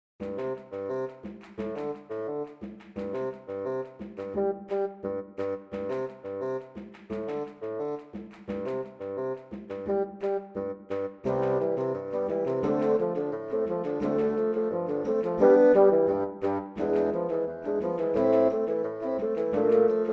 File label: Samba